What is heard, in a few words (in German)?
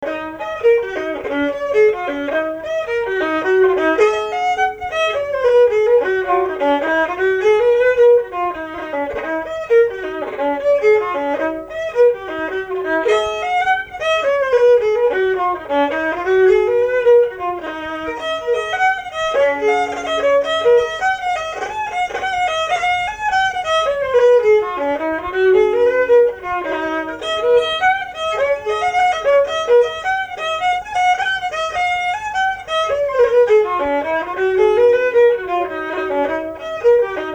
jig e moll